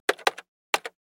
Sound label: keyboard keystrokes; tools; mechanics